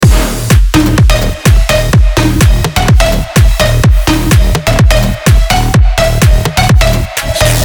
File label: Synth EDM Loop